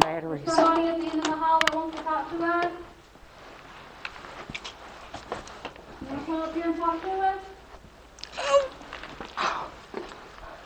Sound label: EVP